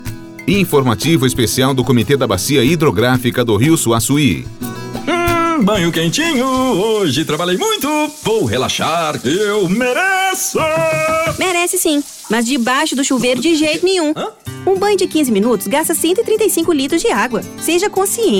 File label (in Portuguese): Cantando no chuveiro